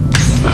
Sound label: Hypospray; trek